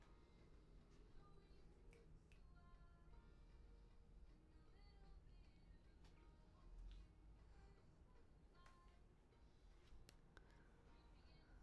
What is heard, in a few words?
BG Vox